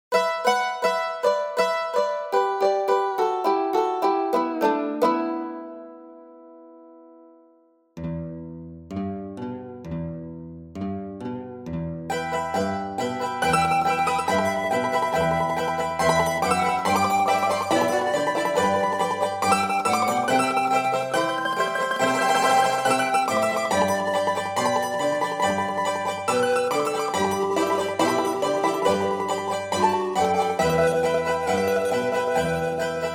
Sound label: Virtual Charango Emulation from Mandolin strum-like effect and Virtual Quena; Kena Flute; Banjodoline